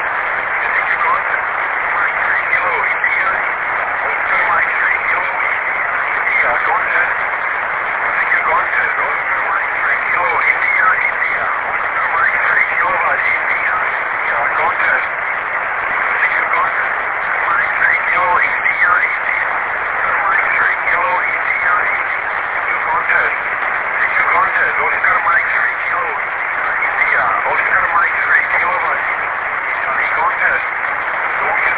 VHF; OM3KII; May 2023

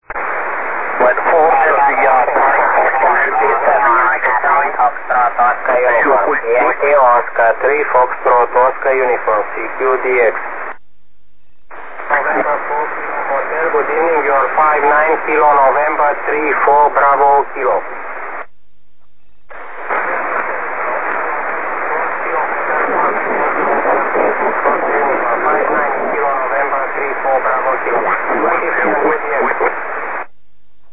2m Es

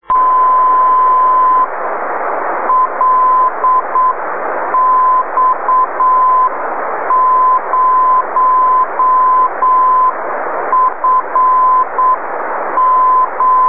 B 4m Tropo; LX0FOUR; 2010

